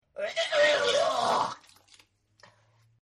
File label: Vomit; Vomiting; Human; Partners In Rhyme